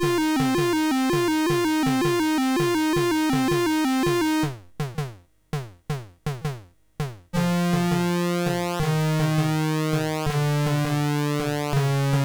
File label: MOS6581R3